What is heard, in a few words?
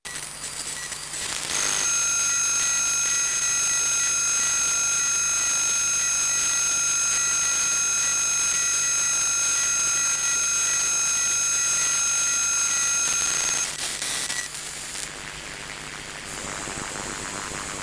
android box; emf